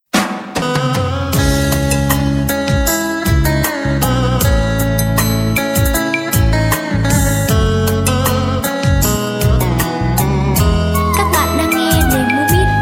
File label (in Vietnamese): Doi con co don beat